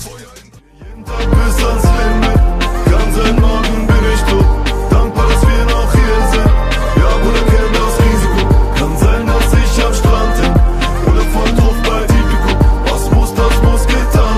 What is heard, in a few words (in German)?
deutsche rington